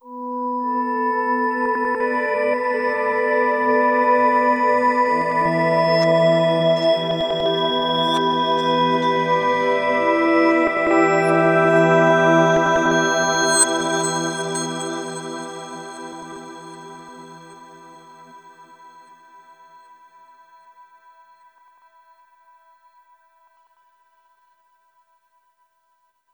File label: Gridnode Anchor Overlay Tone